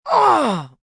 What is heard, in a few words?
Grunt Oh, Grunt, groan, lifting, strain, female, short; Groaning; VoiceBox